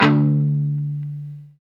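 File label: guitar chunk mute; 90's Sample CDs; Club-50 - Foundations Roland; Funk & Mute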